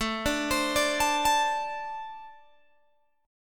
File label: A-Minor Double Flat 5th A Guitar Open G